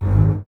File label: BSS G#1, String Ensembles, Basses, f Legato; Miroslav Vitous; 90 s Sample CDs